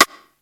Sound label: SNR; 90 sSampleCDs; Crypton - DrumBase5000; COMBINATION1